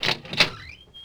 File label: door shop open; left4dead